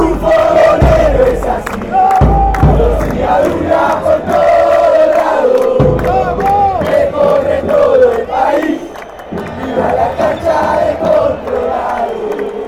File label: douglas haig chant, fan chants